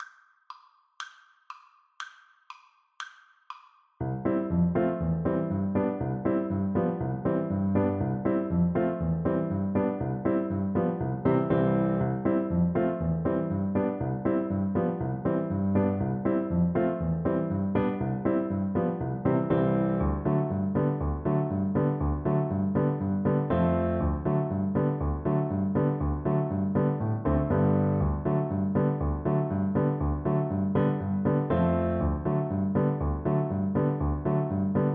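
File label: polka VLC karaoke, Cello; espresso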